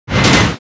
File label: ATTACK